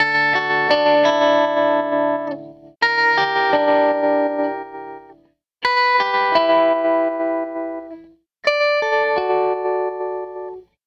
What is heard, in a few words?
Strat Guitar Loop